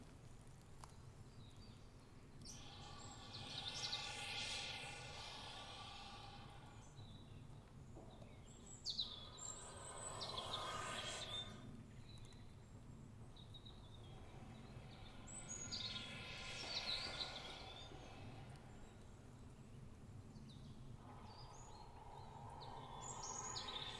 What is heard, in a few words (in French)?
souffle balance